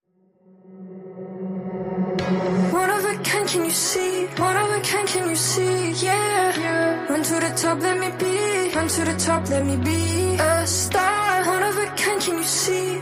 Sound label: Vocals and Synths Only